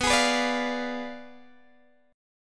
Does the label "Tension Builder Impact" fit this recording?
No